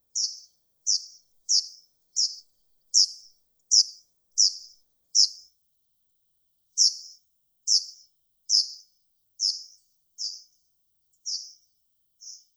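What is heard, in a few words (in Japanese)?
segurosekirei call, Japanese wagtail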